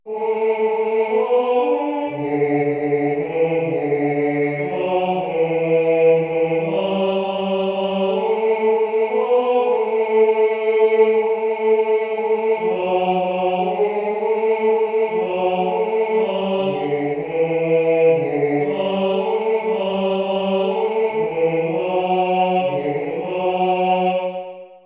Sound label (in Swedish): i vinden tenor